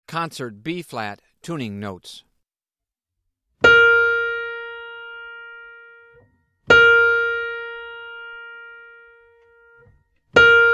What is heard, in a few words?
Tuning Notes; James „Jamey“ Aebersold Play-Alongs